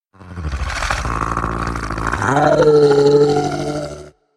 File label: monster angry growl